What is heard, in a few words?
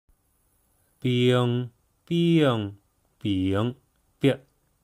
ping